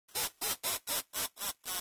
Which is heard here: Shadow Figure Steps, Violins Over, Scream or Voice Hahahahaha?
Voice Hahahahaha